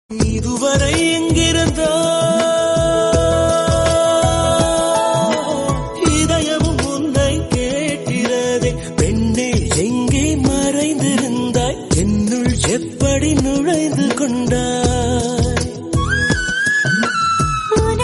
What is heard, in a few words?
tamil ringtone